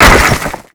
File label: rock fall break; Call of Duty Modern Warfare